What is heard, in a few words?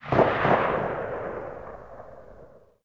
mg 1shot echo